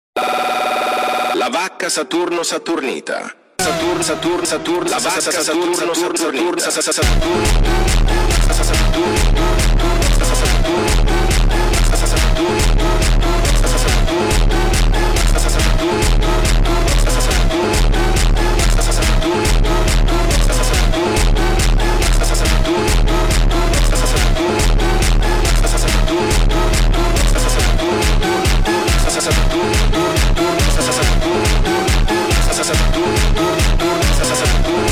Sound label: Funk